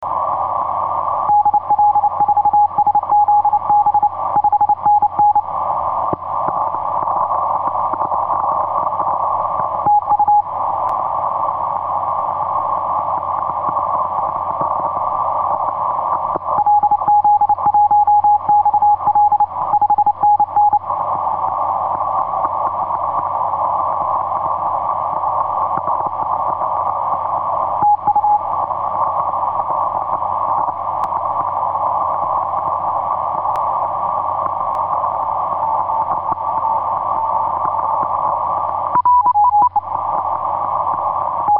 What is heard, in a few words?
30m cw; vk4ma; dl4szb